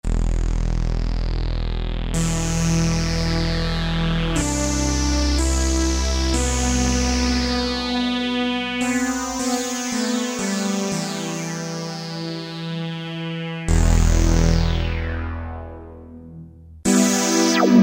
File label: Fargo, Yamaha YPT-400; Voices